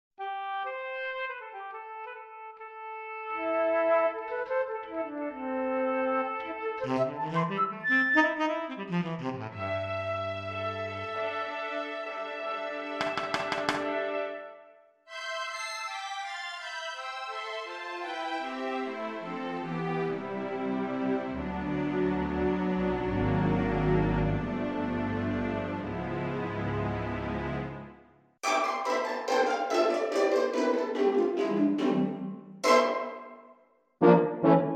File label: orchestra; ncse factory